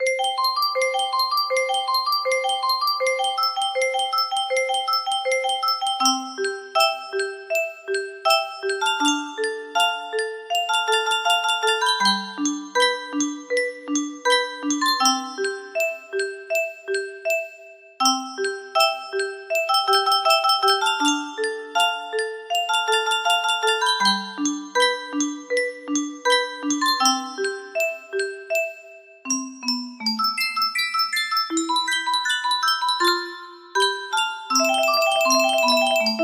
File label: Ballade, music box melody